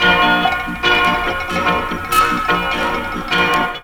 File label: ORGAN LP; ORGANS; Ragga & Dub Reggae